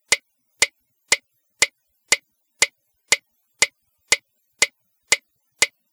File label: Metronome 120